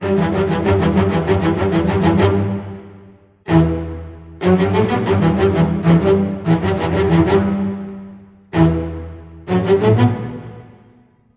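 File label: E minor 2, Low Strings, 95; PowerFX - Downtown Orchestra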